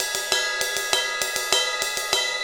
Ride Cymbal Pattern

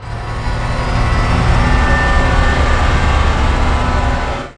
FX SWEEP, SYNTHSWEEPS

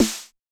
Yamaha DJX 2B, SD Analog

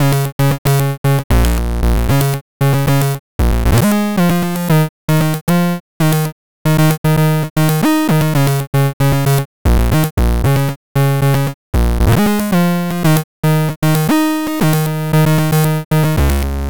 Hott Fuzz, Synths; Sonic Foundry (Sony Creative Software); Modular Electronica

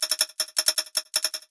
hithat crash cymbal

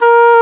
WND D OBOE, Oboe D'amore

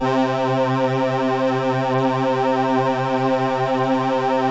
LAMBDA, String Machines; Best Service; XX Large Most Wanted 2

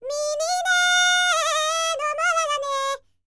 BLACK SEA, World Voices; ILIO; Vocal Planet VOL-5

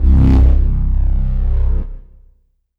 BASS Creeping Analog FX; Polyend; Plughugger

